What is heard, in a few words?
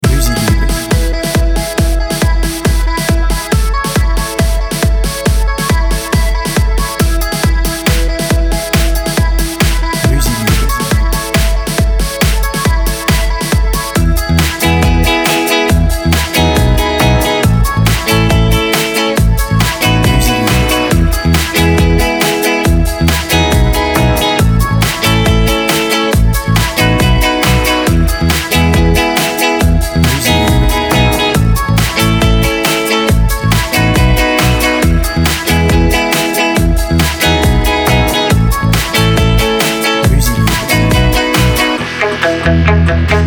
happy fun